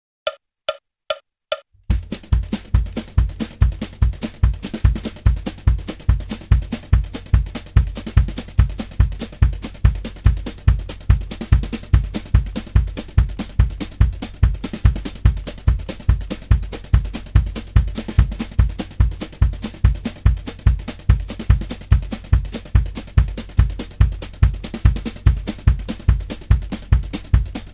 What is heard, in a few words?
Country Rhythm 144 bpm, Guitar; Guitar Aerobics Disc 2